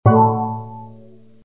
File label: Ambient Hit